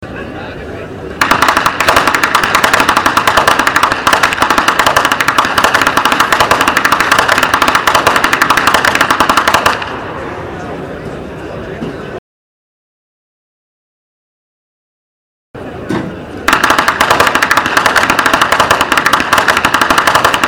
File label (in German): schnalzen